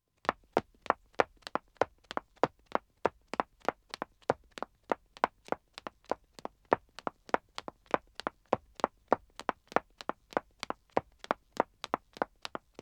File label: Wood (Dead Acc) Running 1 wom, Footsteps; The BBC Sound Effects Library